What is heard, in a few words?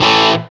GTR Crunch, Dirty; Club-50 - Foundations Roland